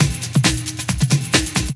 Tronik Break; Jungle; Breaks; Blu Mar Ten - Jungle Jungle - 1989 to 1999 Samplepack

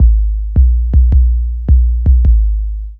Ganga Bass - 1A; Jungle; Blu Mar Ten; Jungle - 1989 to 1999

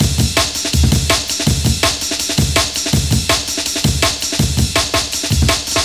Prog, Jungle, Breaks; Blu Mar Ten - Jungle Jungle - 1989 to 1999 Samplepack

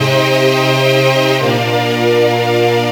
Strings; Blu Mar Ten; Jungle Jungle - 1989 to 1999 Samplepack

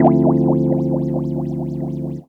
Chord - 7A, Jungle; Riffs, Arps & Hits; Blu Mar Ten - Jungle Jungle - 1989 to 1999 Samplepack

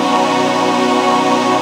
Hoovers Pad, Jungle; Pads; Blu Mar Ten; Jungle - 1989 to 1999

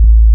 Will They Bass; Jungle; Blu Mar Ten; Jungle - 1989 to 1999

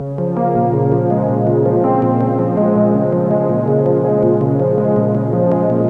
Arp; Blu Mar Ten; Jungle Jungle - 1989 to 1999 Samplepack

